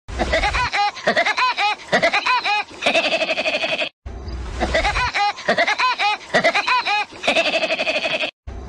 Woody woodpecker laugh; ringtone

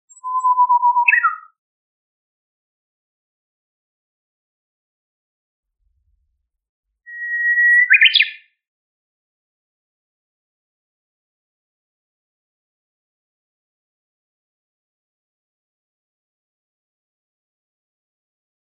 japanese bush warbler Calling Bird; ringtone

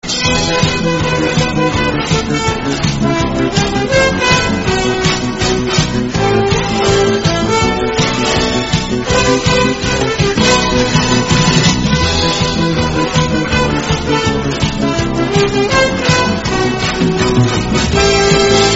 nord star

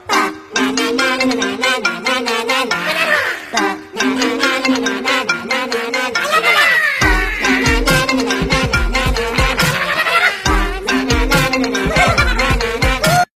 iphone ringtone; minion

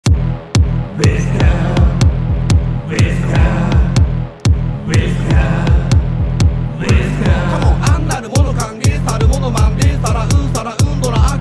Wesker ringtone

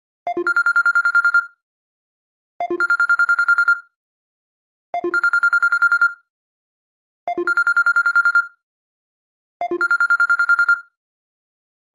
Basic Bell

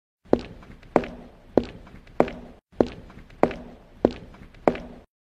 Sound Effects Footsteps

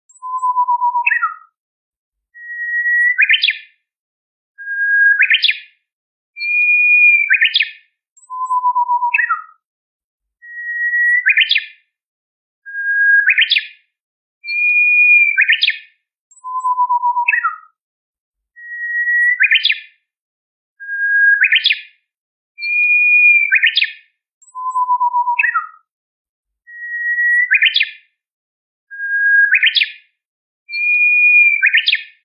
a warbler

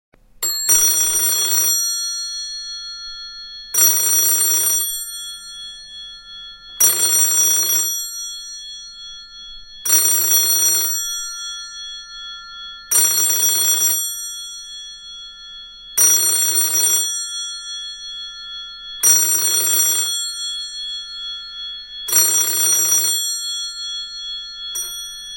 Classic, 着信音; クラシック

